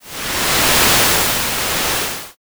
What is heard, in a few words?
wave